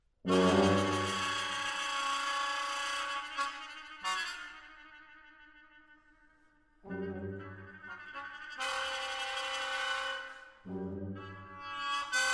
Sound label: Quintet for Brass Instruments; Atlantic Brass Quintet